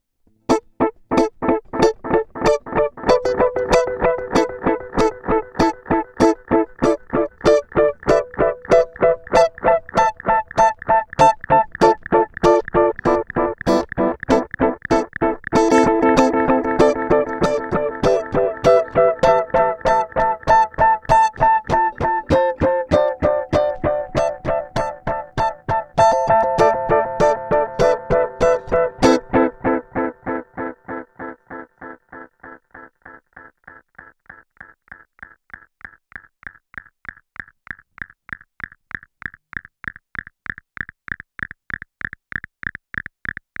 DM 2w Custom Mode

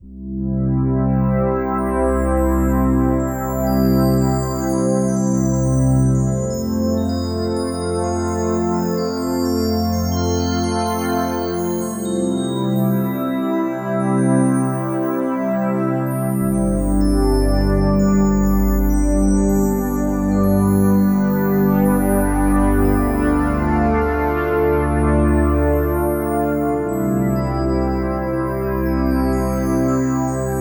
Warm Glitter Pad; Groove Synthesis 3rd

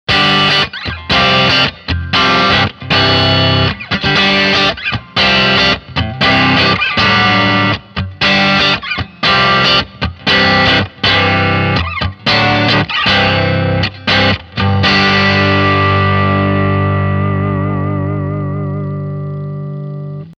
Boss IR-200 Tweed 4x10; Gain 12 h

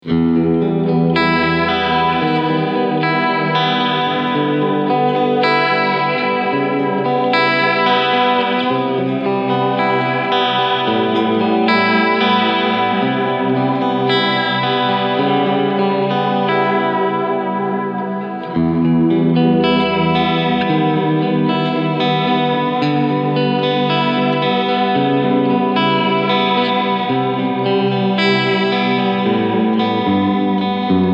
Vibrato

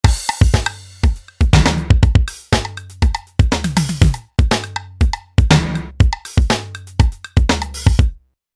HR16 Loop

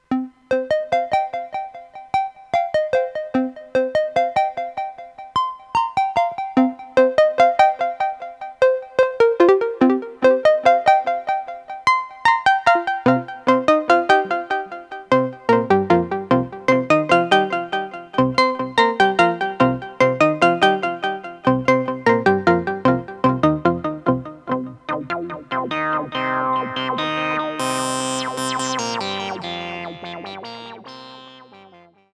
SH-1000 Sequencer Sounds Delay and Reverb

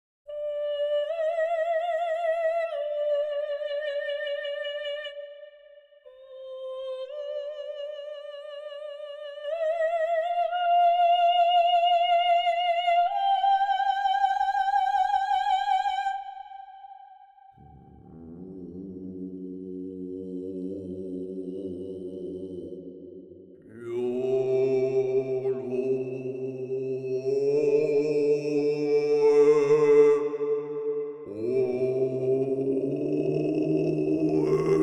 Voices

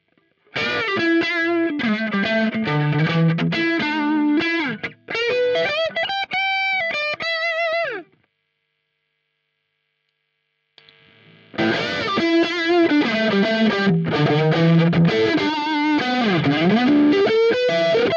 KATANA MARSHALL

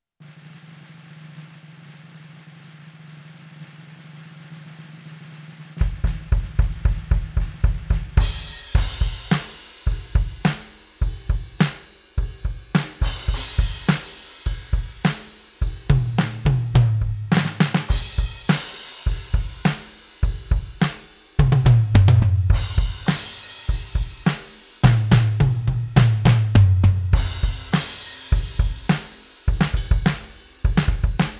PEARL Mimic Pro Vintage Kit

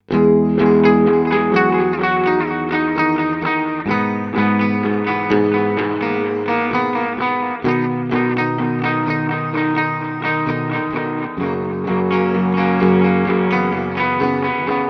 max Saturation; EHX Pico Rerun

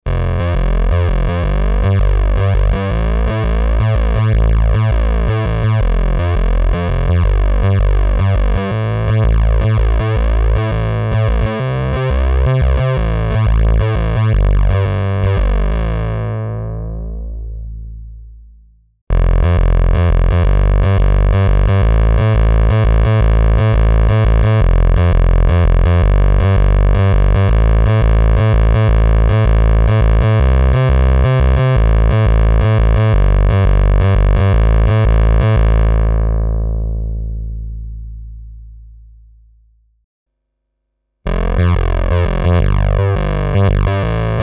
bass seq

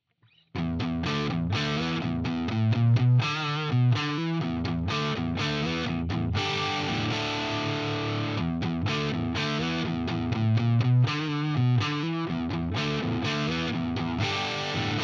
Epiphone ES335 DIST both

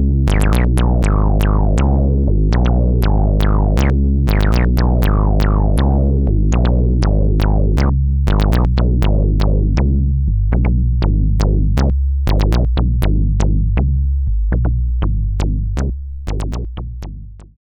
Studio Electronics Tonestar 2600 Filter Full Res

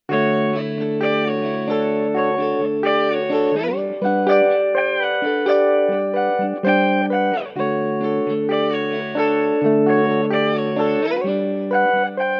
Intelligent Harmony Mode Perfect 5th Up Major

